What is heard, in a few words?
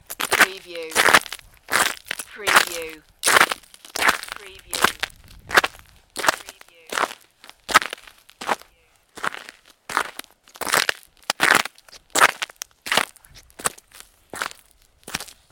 Snow and Ice, Footsteps ice